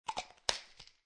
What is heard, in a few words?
Pill Bottle Close Cap, Foley, Pill Bottle, Plastic, Close Cap; Lid; Objects